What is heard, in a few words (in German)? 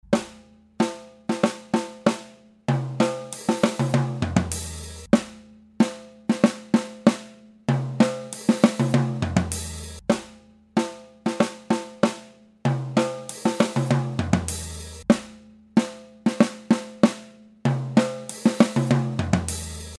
Direktvergleich Snare unaligned aligned Rohsignale; Handyrecorder; DF-Nord Drums Handyrecorder